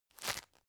tissue grab

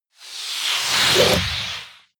whoosh air

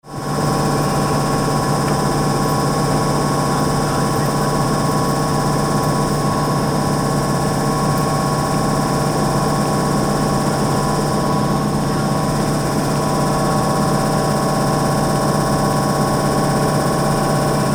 train engine waiting

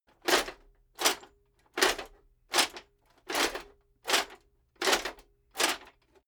metal plate armor movement sequence

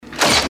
short industrial event

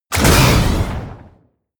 artillery missile truck fire

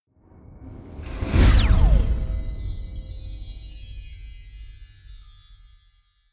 magic warp personal jump sparkle

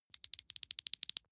plastic wind up rattle small

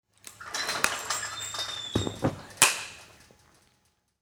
men rings

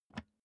office chair lever down

